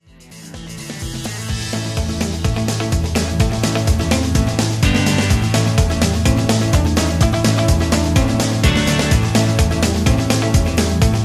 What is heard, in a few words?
High Key